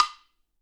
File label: Percussion; Vengeance Samples